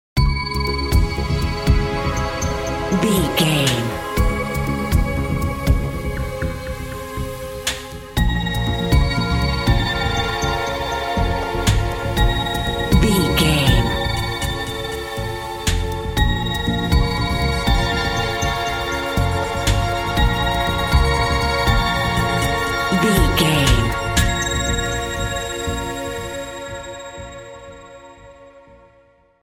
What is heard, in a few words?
mysterious video game expedition